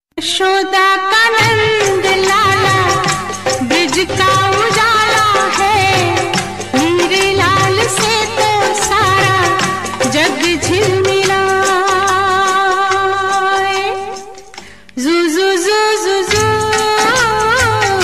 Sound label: Bhakti ringtone, Krishna ringtone; radha krishna ringtone